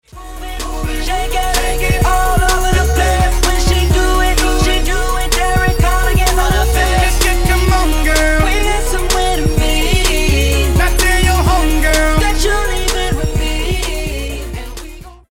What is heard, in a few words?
funk; multitrax